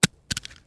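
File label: grass; Mafie 1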